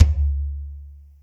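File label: TOM soft; Zero-G; Chemical Beats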